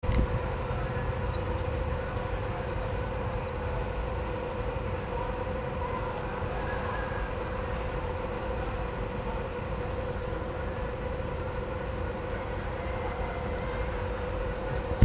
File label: streets ambient noises, phnom penh; 2019; Cambodia phnom penh